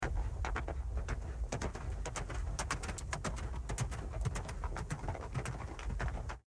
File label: horses